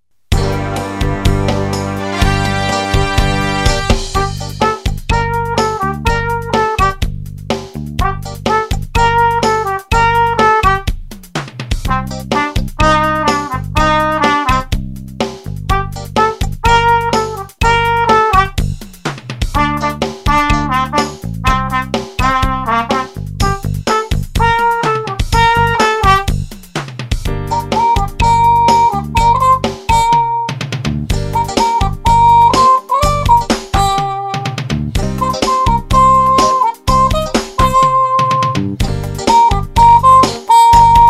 Funky with break

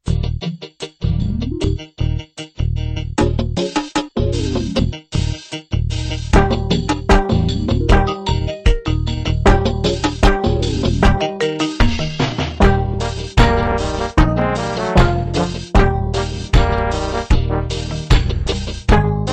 Tenor Horn easy bells

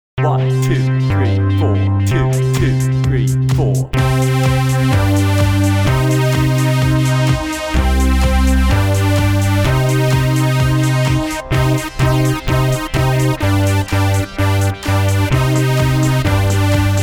rock climb Bb

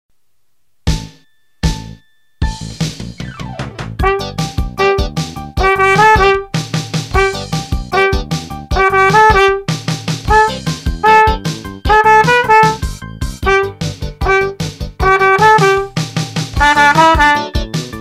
Rock and Roll

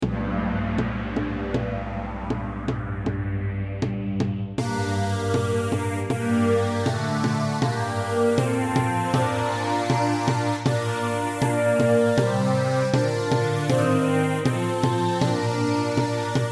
Pavan Gm vers long

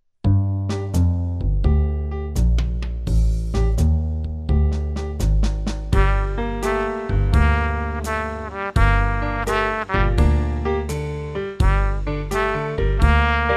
A LOW A BLUES

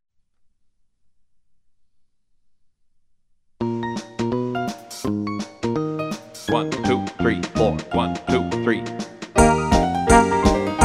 Jazz A

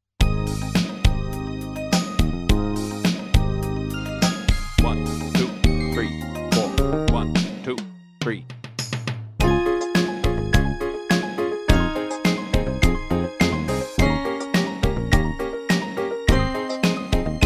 scale down (G-D)

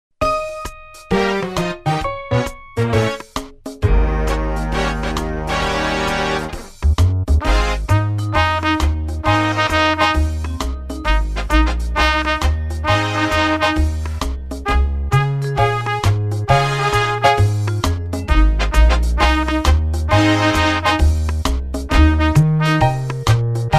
1st valve blues with solo

